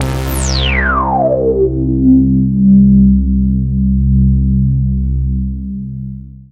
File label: Korg MonoPoly Long Sweep